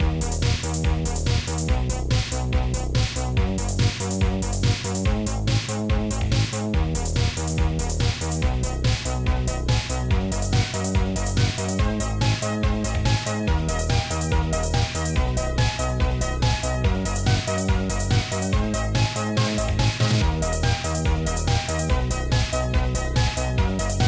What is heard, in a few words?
Boombox Music; Boombox Music5 Zedfox